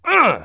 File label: ahhn; phrases funny; Wired for Sound Pro CD; Aristosoft, Inc.